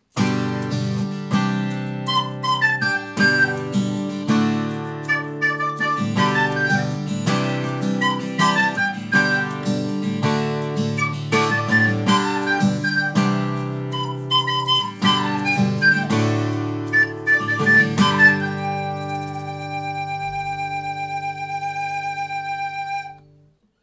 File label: usb mic